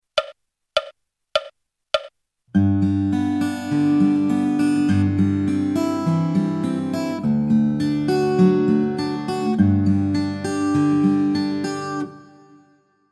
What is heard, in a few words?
fingerstyle